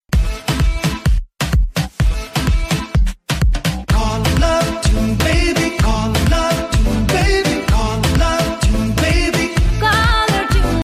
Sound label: caller tune ringtone